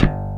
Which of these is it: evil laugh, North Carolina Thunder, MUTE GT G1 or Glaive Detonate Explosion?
MUTE GT G1